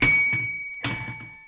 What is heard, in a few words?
musical; GRSITES Graphics